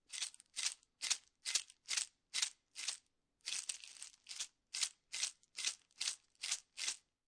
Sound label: Jar With Beads Many Shakes, Foley, Jar, Small Plastic Jar Filled With Beads, Several Shakes; Shaking Sound Effects